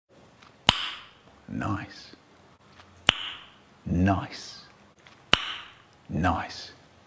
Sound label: clicking noise nice